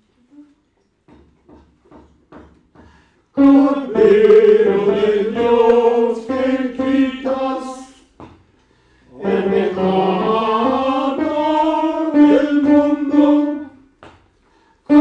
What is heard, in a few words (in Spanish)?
Cordero De Dios Tenor